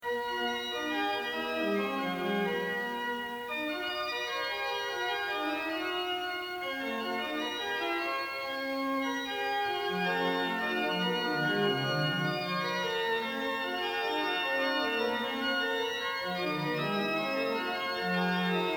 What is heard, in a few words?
French Organ Music fugue in B; dupre